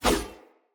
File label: Player Short Sword Whoosh; Weapons; Swords